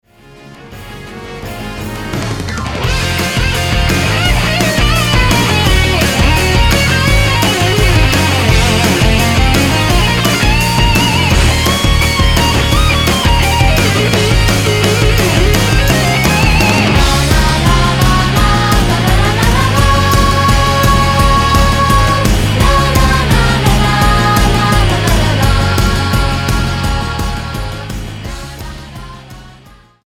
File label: cheerful party